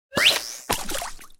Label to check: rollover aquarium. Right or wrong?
Right